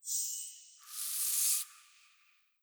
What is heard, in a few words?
map events slide